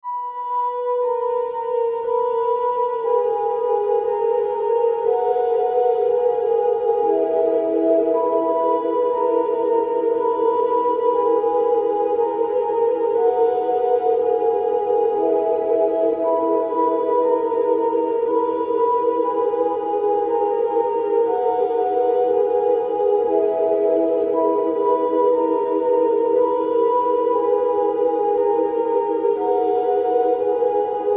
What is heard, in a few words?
Sinewave